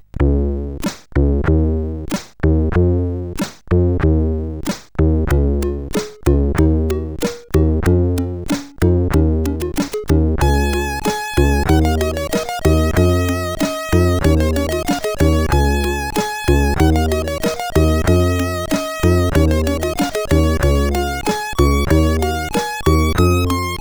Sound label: End Music CSG8580R5